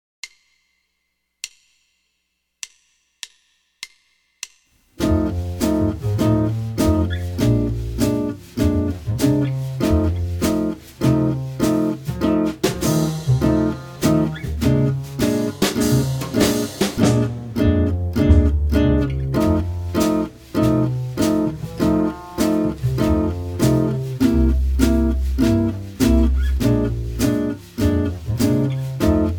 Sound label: F Major Root 5, jazz guitar